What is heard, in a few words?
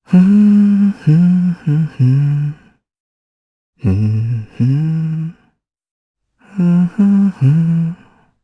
Hum, jp; Pavel; kings raid